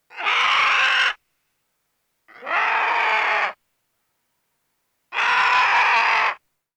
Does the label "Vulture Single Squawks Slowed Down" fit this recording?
Yes